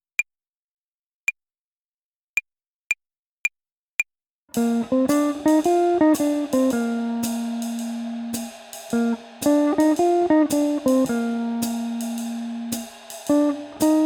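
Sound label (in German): Swing Phrasierung Übung; play along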